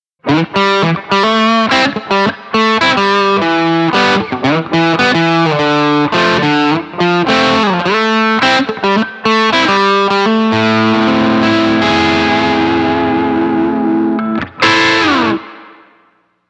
Bridge Dirty, pickups

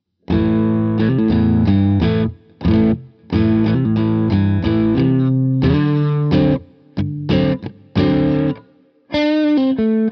Middle Full Dirty, pickups

